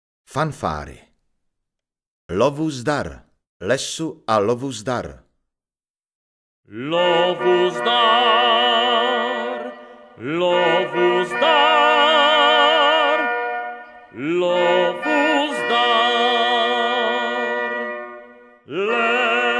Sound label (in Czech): Lov uzdar; desatero